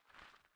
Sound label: hoe, farming; 0ad